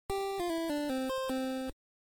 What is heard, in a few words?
Slot Machine - You Lose